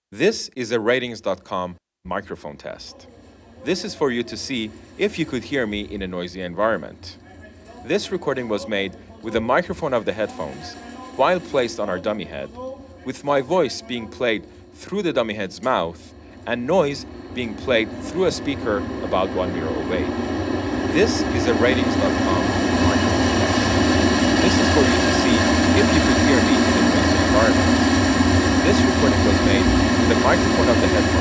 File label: subway night